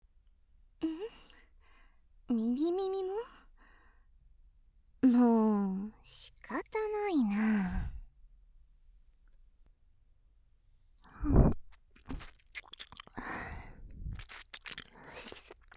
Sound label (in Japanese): 右耳舐め